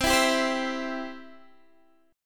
C Major, C, down, Guitar, Standard; strummed; chord; JGuitar

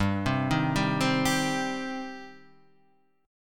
G Suspended 4th Sharp 5th G, Guitar; Gsus4#5 Chord {3 3 1 0 1 3} arpeggiated

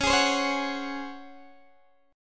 C sharp Major 7th Suspended 4th, Guitar, DADGAD

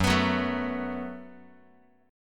Gb Minor Double Flat 5th Gb Guitar; strummed; x,2,2,4,1,x; 4A,4E,5A,5D,5G#,6C#

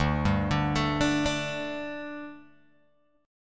D Suspended 4th D, Guitar, Open G

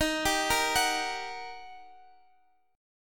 G Minor Major 7th Sharp 5th Eb

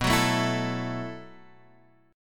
B 7th Suspended 4th B down Guitar Half Step Down

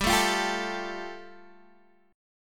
G 7th Suspended 2nd, Guitar DADGAD

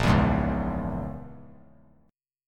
Bb Minor 7th Flat 5th, guitar; Bbm7b5 strummed

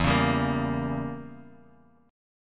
E Diminished 7th E, Bass Standard; Edim7 strummed; JGuitar

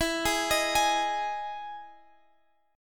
E Major 7th E; chord shape

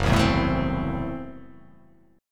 F sharp Minor 7th Flat 5th, guitar chord; strummed; JGuitar

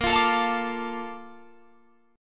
Bb Major 7th, Guitar, Drop D

